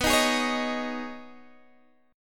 B 6th Add 9th B down Guitar DADGAD

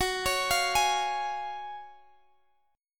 C sharp Major F sharp; arpeggiated

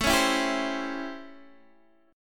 A 7th Sharp 9th, Guitar, Open D; x,12,11,10,10,10

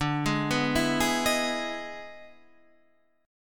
E Major 7th D, E/D Major 7th chord

